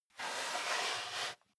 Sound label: step barefoot wood plank slide long; schritte; Footsteps